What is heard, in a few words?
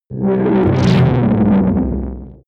energy shield down; energie